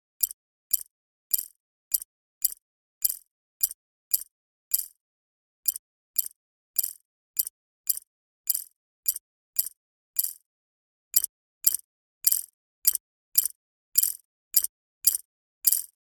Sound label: Breaking Large Ice; Cgeffex